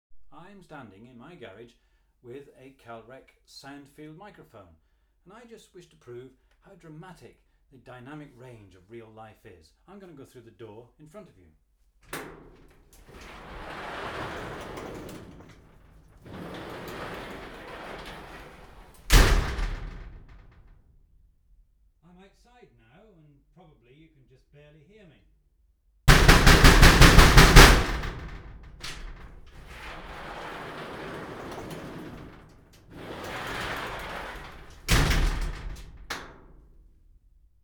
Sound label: The Garage Door; Triangle Electroacoustique; Revue Audio